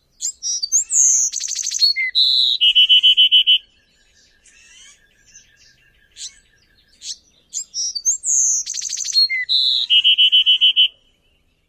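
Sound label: Bewick's Wren song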